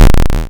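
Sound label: waveform FM square sine envelope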